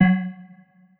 cancel

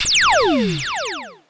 magical attack

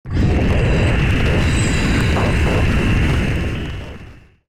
transformation

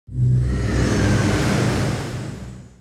open the demon's gate

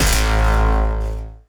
attack

